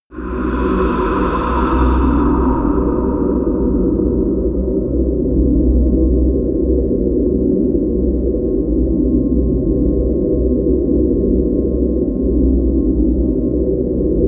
drone catacombs

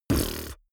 beatbox bass drum wobble

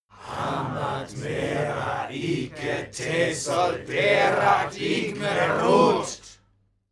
male crowd evoke

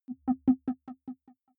button echo distorted

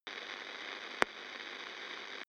promo pad

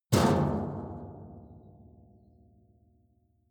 metal impact can large

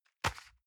envelope drop medium slide

